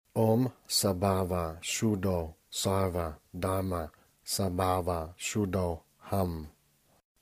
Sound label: Śunyata Emptiness Mantra Tibetan